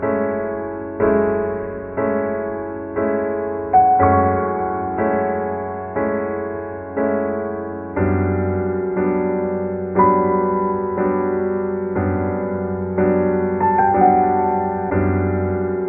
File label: piano, sad loop